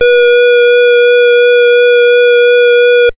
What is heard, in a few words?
SID lead B; ChipShop Deluxe; ChipShop Hits